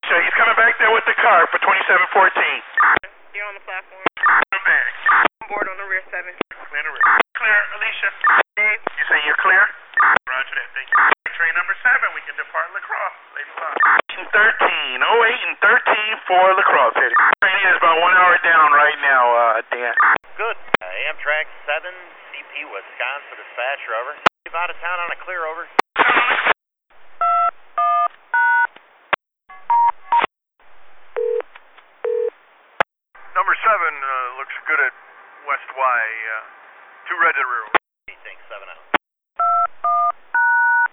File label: Amtrak